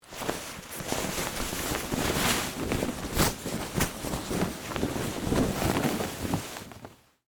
Canvas Tarp Unfold, Foley, Canvas, Tarp, Unfold; Rustling; BlastWave

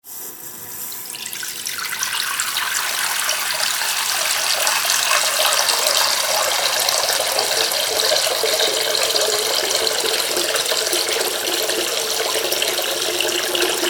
household sink water fill; Sink Filling With Water From Tap; Kitchen

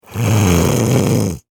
Human Male Snort; SFX Bible 6

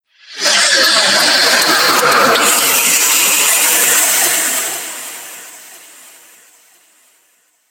Faster Than Hyperspace, sci fi sound effect

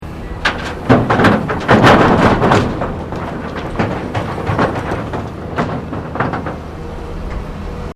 Construction Earthmover Unloading Into Dumptruck; Various construction machinery

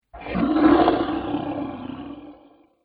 Dinosaur Growling; Dinosaur Sound Effects; animal sound effects

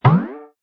Cartoon Tympani Boing, nice upwards bounce.; Comedy/Cartoon

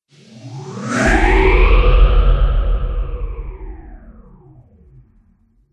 Trailer Hit Stun Phaser Swoosh

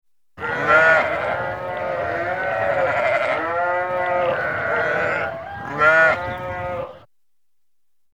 Sheep Group; Animals

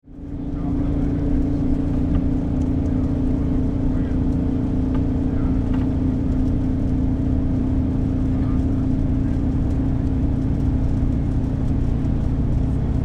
Industry Fan Blower Run, factory; SFX Bible

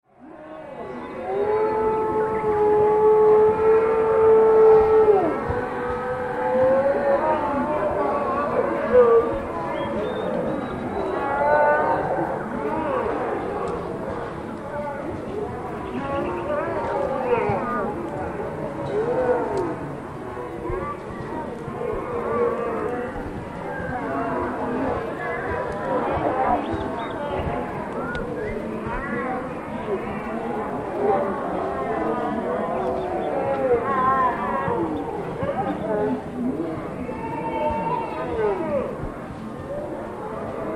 School children in playground activity atmosphere; many kids shouting & running about

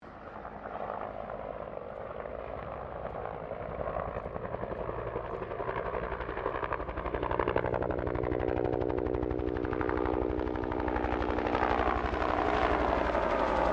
HELICOPTER 1967 BELL UH1 IROQUIOS PASS DISTANT; RAN UH-1 Iroguios; Vehicles; Aircraft; Helicopter Sound Effects; Helicopters (Sound Effects:Vehicles)

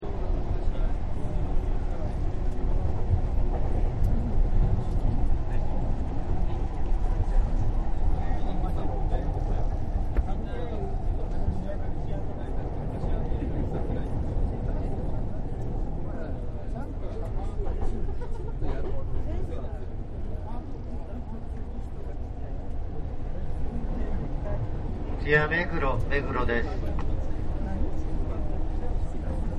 Tokyo Train, Train Station; Vehicles; Partners In Rhyme

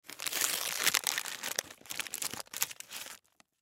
Cupcake Open Wrapper Snack Junk; Crumple Small Snack Junk Food; foley; Blastwave

